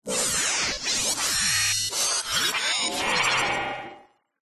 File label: science fiction machine or robot glitch